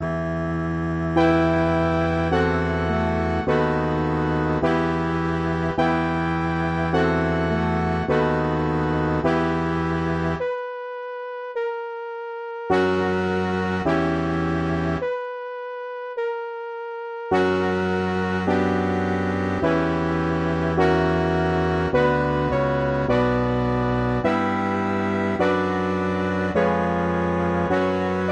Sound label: MIDI; BWV4